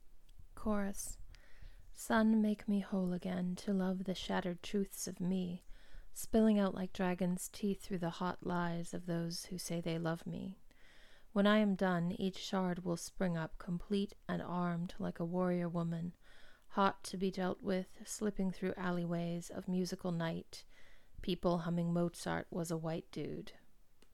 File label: chorus